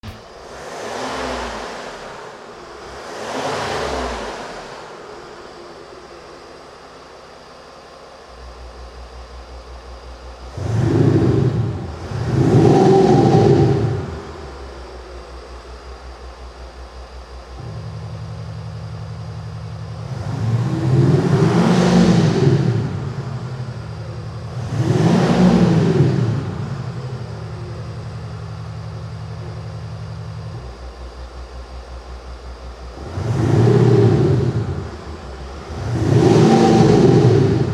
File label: Sound Booster land rover extended; KUFATEC